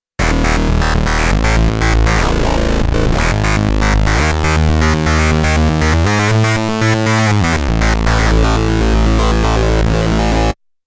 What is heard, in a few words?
Seq X Mod Bass; KOPRO+